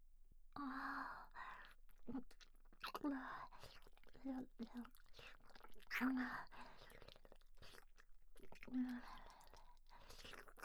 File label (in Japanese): 亀頭フェラマッサージ; 罰ゲーム; 絶頂ポイント